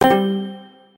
match confirm

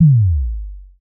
combobreak

